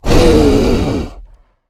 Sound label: roar; smite; god sobek